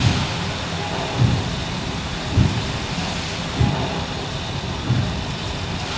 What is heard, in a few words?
train, ambient desert train; World of Tanks Blitz